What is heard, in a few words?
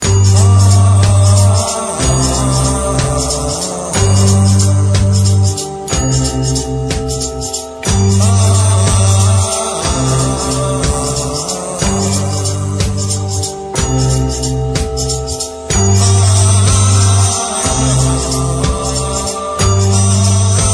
supernatural chant ringtone